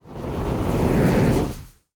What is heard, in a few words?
plane; World of Tanks